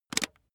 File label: razor attachment retract hard